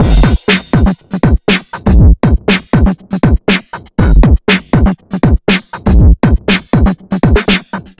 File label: trip hip 120bpm, downbeat dance grooves